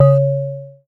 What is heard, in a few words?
C#, BELL GONG, Synclav FM; Big Fish Audio